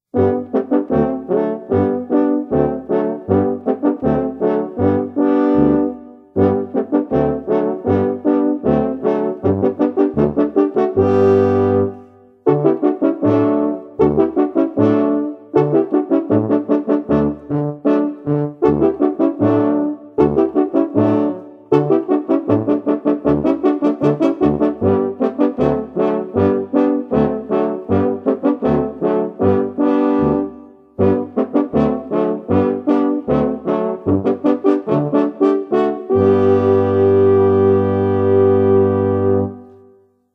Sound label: Christmas Concerto Instrumental